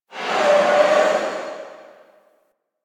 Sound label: Rail Jack Destroyer Machine One Off